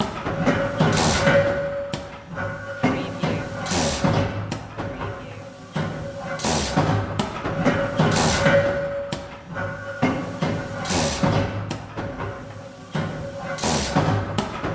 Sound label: Press Type 1 Running Loop